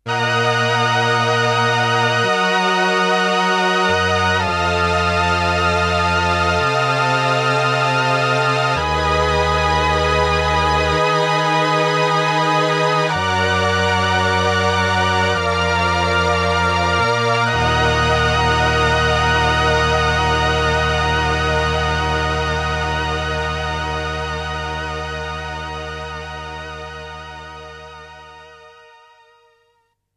T REX Replicator Module Strings ohne Replicator

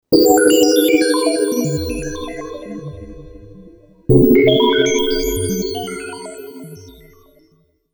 Alesis Fusion FM Synth

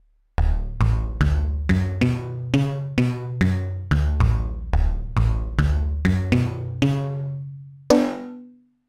Yamaha YS-200 FX Distortion

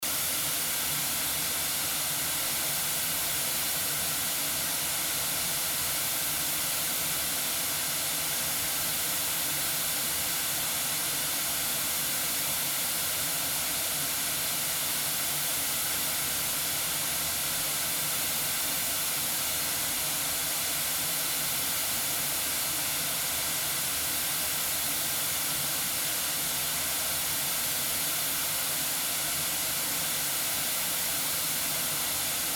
noise 12dbfs

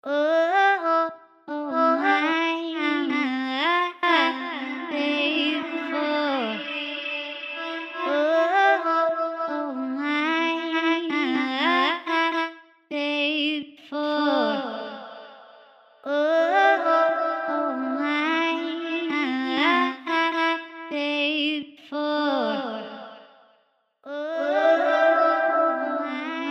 Vocal Samples Tongues; Portal

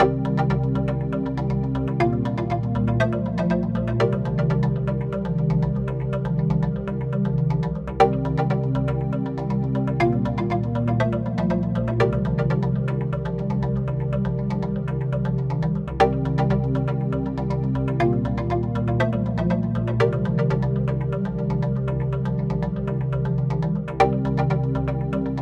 Flange Bass, 120 C; Berlin Night; MFX

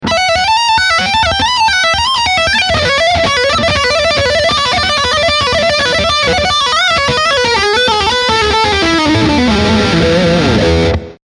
Fast Lick Solo Channel

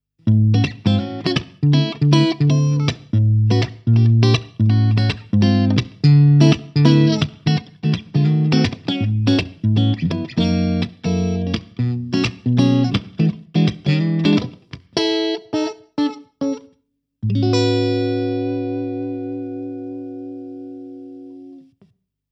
Rickenbacker 350V63 Clean Neck Pickup

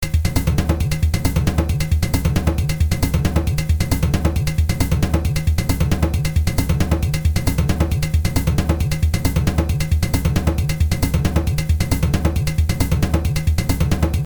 Multi Layer Sequence

